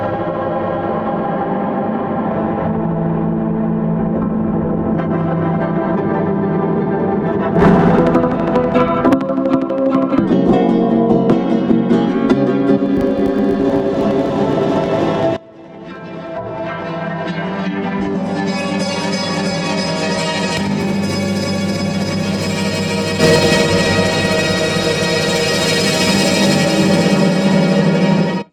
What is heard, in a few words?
mandolin